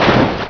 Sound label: ROLL, Prangs